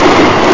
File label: Train